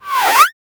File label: short sharp tire skid